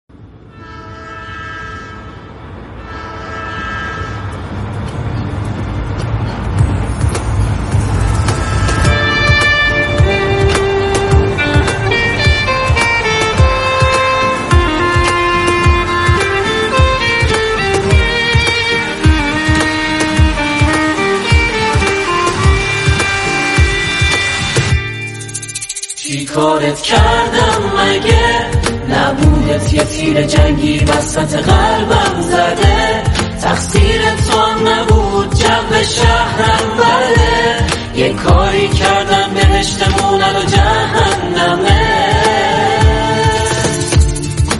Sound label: guitar